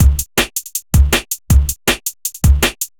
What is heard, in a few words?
Classic Beat, Drum N Bass